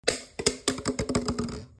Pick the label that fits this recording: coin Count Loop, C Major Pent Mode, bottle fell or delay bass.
bottle fell